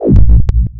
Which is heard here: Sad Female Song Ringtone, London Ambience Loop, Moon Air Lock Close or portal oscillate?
portal oscillate